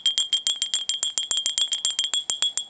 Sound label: bell